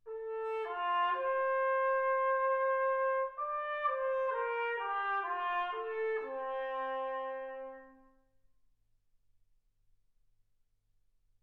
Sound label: trumpet